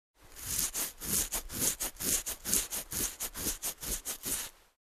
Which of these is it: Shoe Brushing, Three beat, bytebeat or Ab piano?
Shoe Brushing